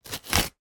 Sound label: cardboard rip